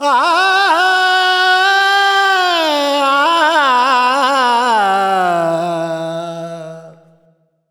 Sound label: Expression, Vocals Male World Pop; Sonic Foundry (Sony Creative Software)